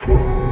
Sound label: Railgun Start